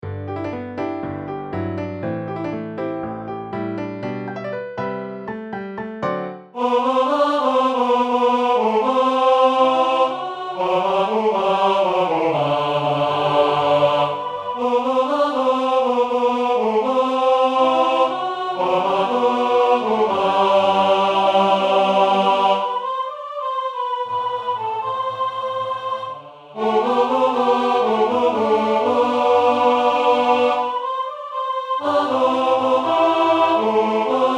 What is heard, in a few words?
Night Tenor